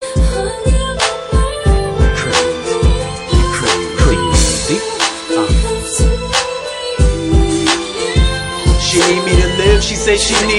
hiphop

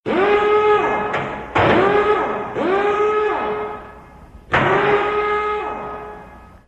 Car Body Shop

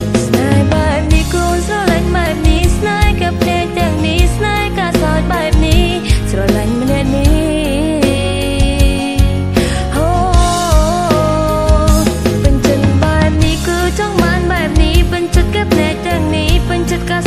Khmer Song

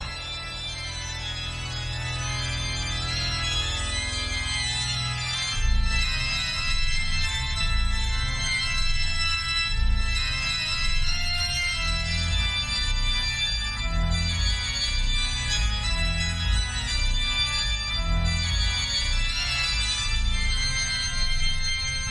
Organ; Good Omens

